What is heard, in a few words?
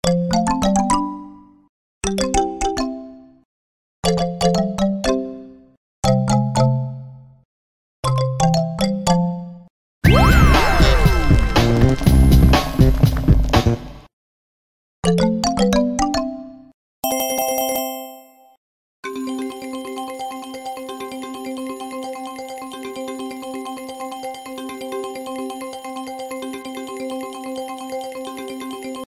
win Sounds; EGT